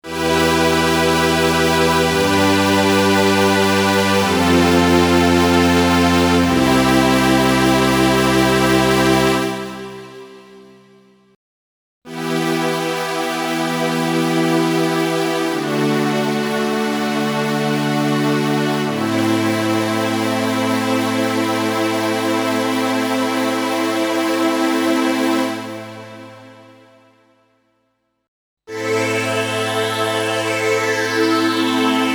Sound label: motifxs LIVE Stringensemble